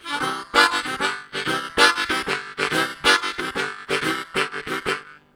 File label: High Bass, Harmonica; Zero G